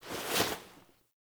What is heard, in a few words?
Hand Swipe Foley; Sacrifice; Lotus Helmet; Cinematics